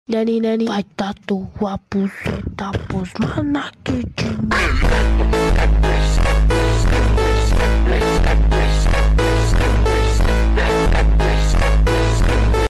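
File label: phonk brr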